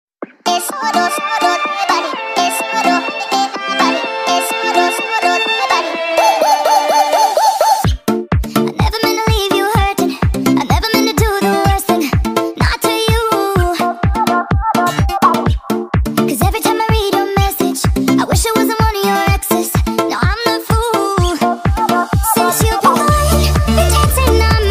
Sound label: speed up